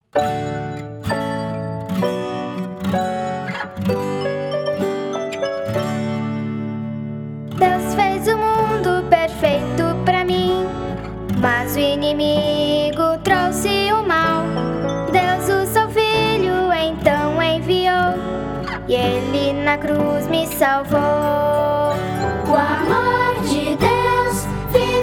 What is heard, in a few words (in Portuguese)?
musica o amor vive infantil; semana santa